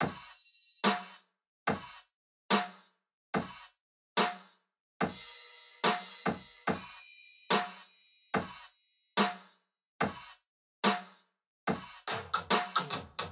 Drum Loop, 144 BPM Room, Future Bass; Loop Stems; Cymatics; GHOST (Beta Pack); Brisket

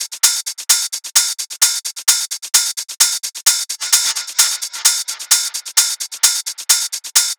Hat Loop 130, Techno; Ghosthack; Free Techno Sounds 2024; Hats

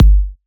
Techno Kick

